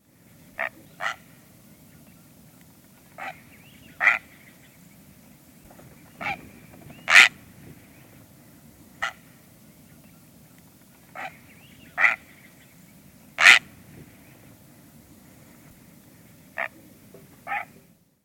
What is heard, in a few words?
great blue heron